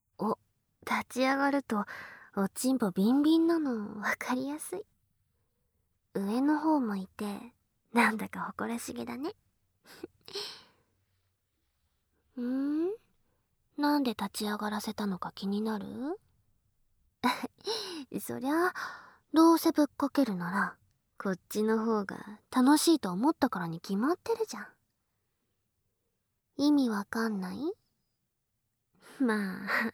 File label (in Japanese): 立ち素股&耳舐め, ASMR